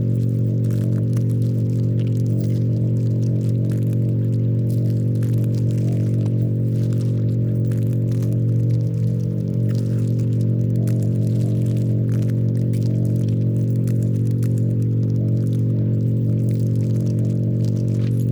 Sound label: pro ship construction idle; biogenesis